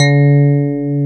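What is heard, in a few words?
electric piano, hard; Super E.P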